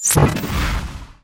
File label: portal open, portalgun; portalmod gun; weapons